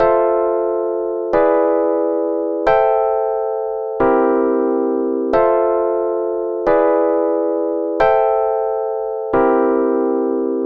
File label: Chord Sweet FM7 E7 Am7 C7